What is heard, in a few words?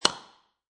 Hammer Nail, Industry, Hammer, Hammering Nail Into Wood